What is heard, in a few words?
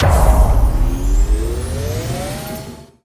Landing; mercy of the gods